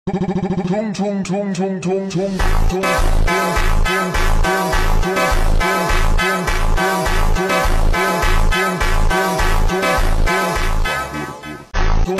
FUNK Slowed and Reverb; TUNG SAHUR

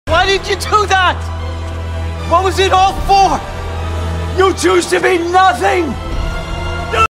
screaming; GOW ragnarok; Odin; meme

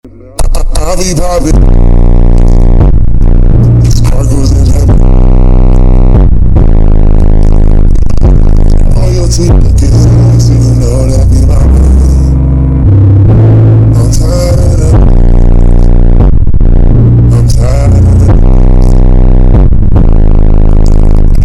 super bass boosted

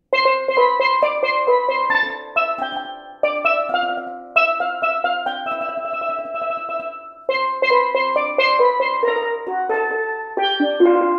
Steelpan Cover

